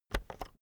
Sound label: keyboard external enter press slow